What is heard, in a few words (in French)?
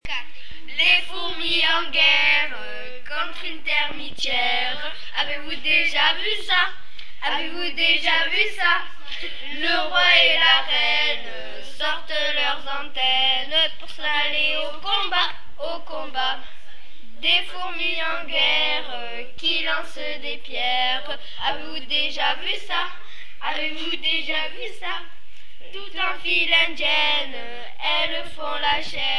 groupe, chant